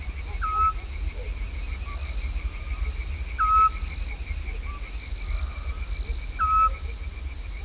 call, scops owl, Maybe a male call: note a lower dominant frequency than those of Recording 3 and Recording 4. Background: crickets and amphibian calls.; otus scops